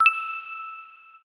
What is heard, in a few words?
Blink